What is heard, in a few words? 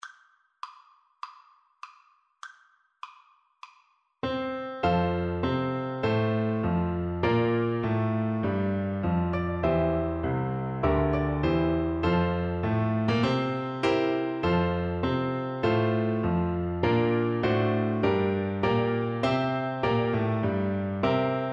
the internationale, Flute